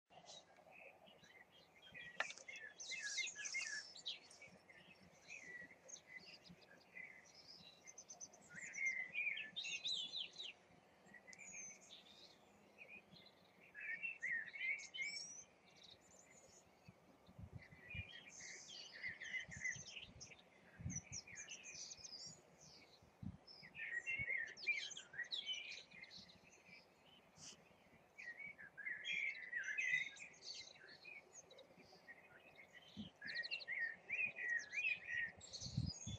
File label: blackbird birdsong